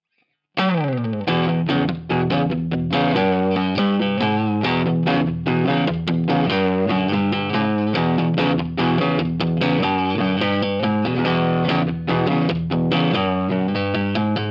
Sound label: Dirty Neck Marshall Plexi; three Fedora pickups (6K each)